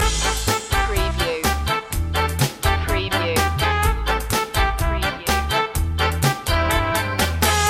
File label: rainy day ska loop d